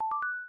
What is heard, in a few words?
notification chime